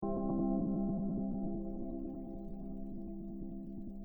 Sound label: harp test